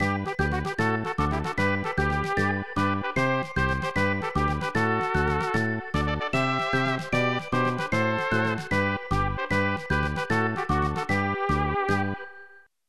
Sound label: Unused Jingle; Satellaview BS-X